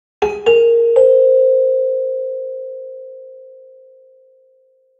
Marimba

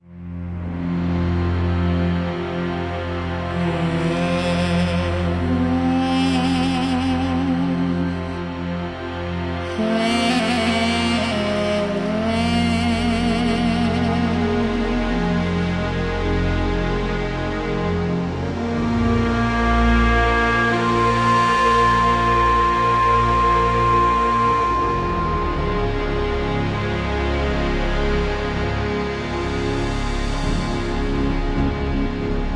bgm lost; devils demons android game music

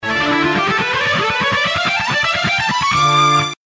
Victory Music

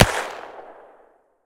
Gun Submachine Auto Shot Last With Tail

